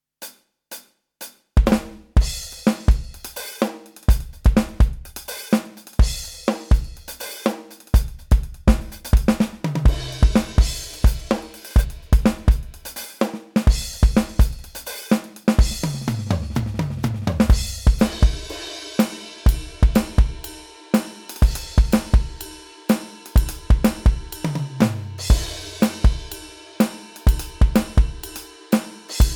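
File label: ROLAND TD 02KV Acoustic Jam, drums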